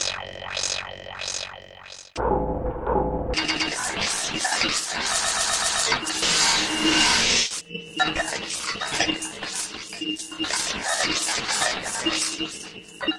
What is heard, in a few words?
Alien Brain Scanner